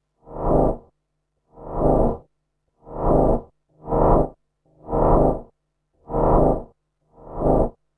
martian lid

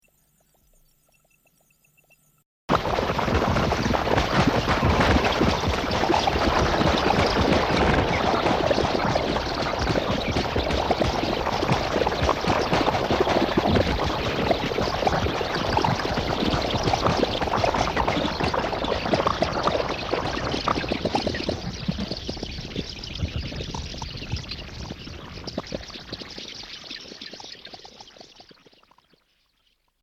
keys jangle

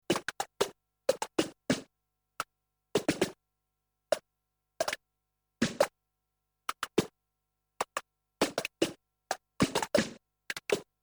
bubblewrap pops

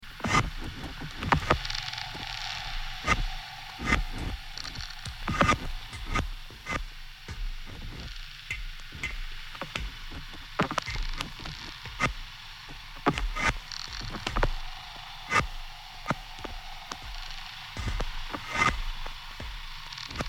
Slow creak

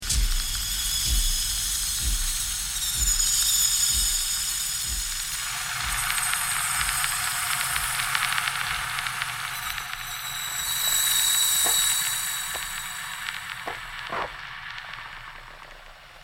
Screeching reverb

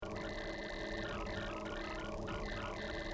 Drain variations

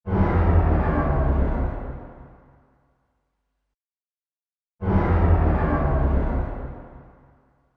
carnival; asoundaday

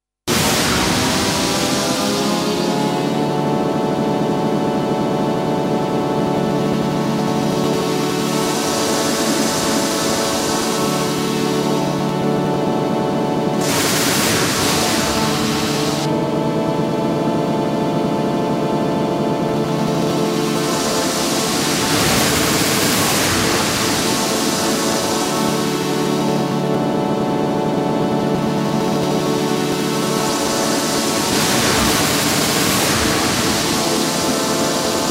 time travel

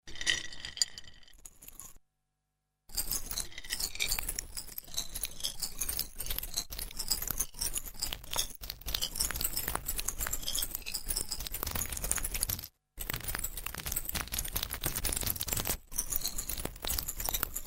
screw ant

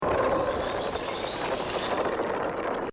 Sucking sizzle

